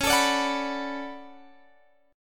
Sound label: D Major 13th C sharp, chord